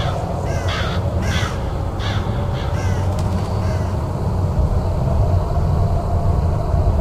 ambience graveyard; everness

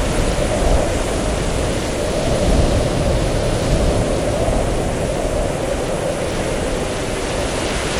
ambience snow storm; everness

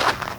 sand footstep; everness

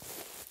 grass footstep; everness

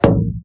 glass footstep; everness

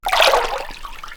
water footstep; everness

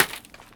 gravel hit; everness

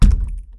chest close; everness

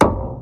metal place; everness

